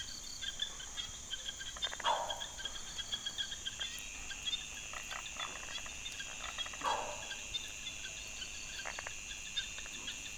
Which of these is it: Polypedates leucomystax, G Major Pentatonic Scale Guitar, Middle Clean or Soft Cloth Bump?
Polypedates leucomystax